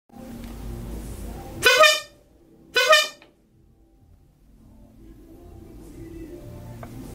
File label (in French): Test klaxon trompe VELO; MISS VELO